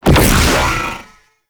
Orb Fire; Warframe; Sentients; Sentient Eidolon Ghost Caster; Abilities

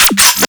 Energy Rifle Reload; Weapons

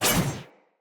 Sword Whoosh Heavy; Weapons; Swords; Garren Sword

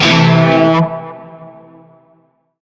Shawzin Pent Maj Chord; Warframe; Narmer Shawzin; Chords

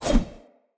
Short Sword Whoosh; Weapons; Swords; Sollos

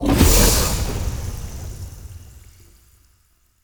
stag blinding light impact; Powers

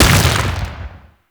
Rock Elemental Punch Impact; Warframe; Warframes; Brawler

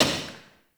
Turnstile Stop; Ambience; Gameplay; Warframe; Entrati

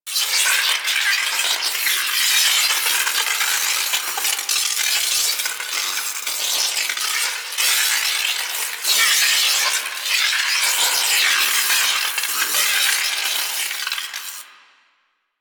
Lightning Stomp Ground Electricity; Warframe; The New War; Archon; Boreal; Abilities

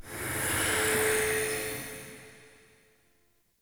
Swarm Screen Scan End; Entrati

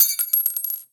Belt Fed Rifle Links; Warframe; Tenno; Frumentarius

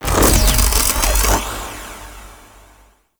Leg Charge Up; Enemies; Weapons; Warframe; Arachnoid Camper

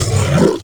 Flying Mouth Attack Fire Empty; Enemies; Warframe; ManInTheWall

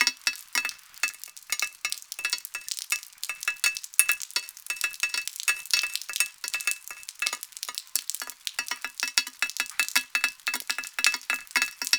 Drips Metal Mono Loop; Cave; Ambience; Warframe; Stalker

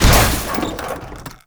Common Military Crate Destroy; Objects; Storage Container; Warframe

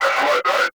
Scan Vocal; Frumentarius

